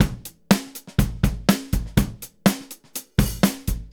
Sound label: 123 groove; AMG Gota Yashiki Groove Activator